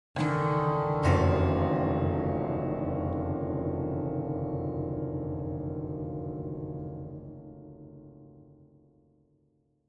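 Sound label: Grand Piano Strings; keyboards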